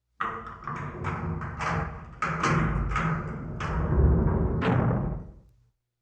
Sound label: Jail Door, Open, Close